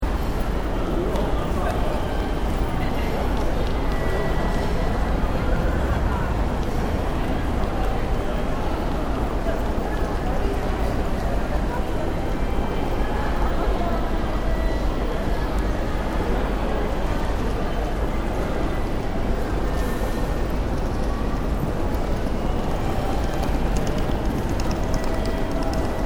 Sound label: Ambience Tokyo Ueno Station, Large train station in Tokyo; Loop